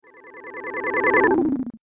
Magic Power Down; Magic Sound Effects

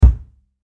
Impact Wall Hit Solid; Thuds (Sound Effects:Objects)

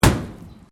Bricks Metal Movement

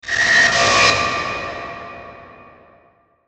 Electrical Distortion Overload Feedback; Production Element Imaging Element Accent Transition

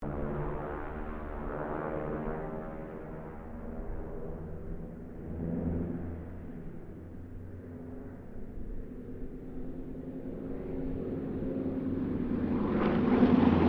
Harvard squadron 4 pass, stereo; Mixed aircraft squadrons; Airplane; Airplanes; Vehicles

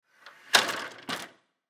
Ladder Open, Ladder Open Aluminum; Machinery; Machines; INDUSTRY; Blastwave

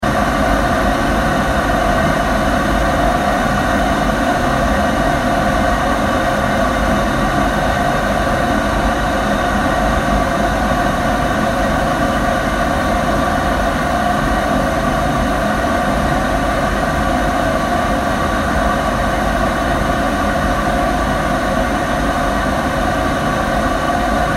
household boiler large operating; Large Boiler Operating - Hiss And Drone Of Large Boiler Close - Up ( Domestic But Could Be; Furnaces

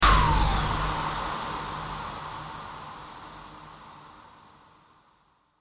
Trailer Accent; Trailer Sound Effects; sound design

